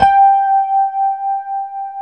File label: E-RHODES; Best Service Advanced Media Trax Modern Composer